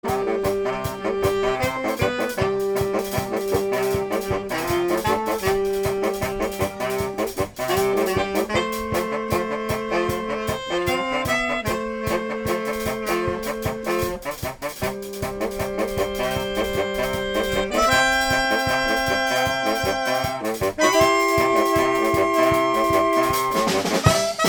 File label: saxofolie